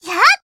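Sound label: attack, Japanese; suzette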